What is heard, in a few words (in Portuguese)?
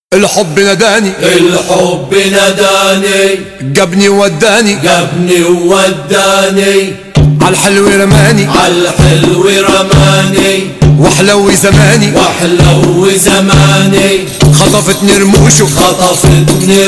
Música árabe graves